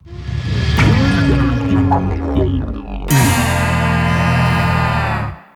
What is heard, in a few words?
4th 8th Place Goal Fanfare; Fossil Fuel Injection; crash bandicoot series sound box